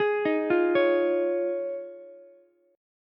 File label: D sharp 7th Suspended 2nd Suspended 4th, Ukulele; D#7sus2sus4 arpeggiated; JGuitar